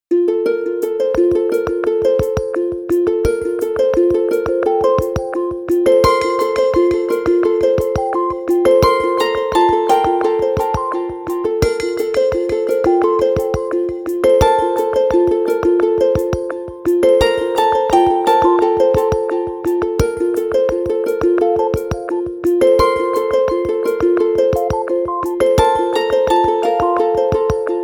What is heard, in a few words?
Alarm Twilight; alarms